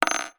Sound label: household ring jewelry palladium down on wood surface; zapsplat